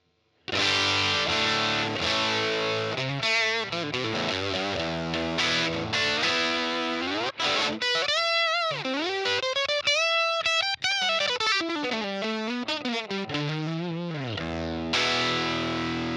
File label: Woodrow 55 JP12 STOCK Boost